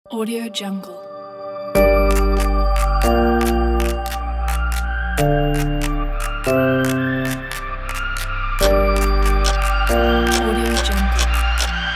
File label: summer vlog